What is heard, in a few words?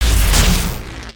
dummy spawn